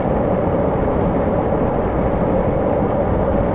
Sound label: glider; Original TK Sounds